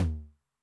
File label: Noise Med, 909 LOW TOM